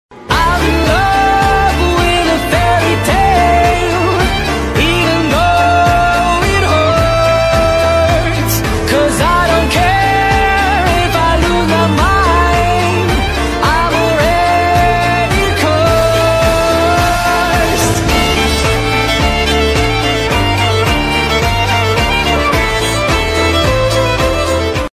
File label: amazing bgm ringtone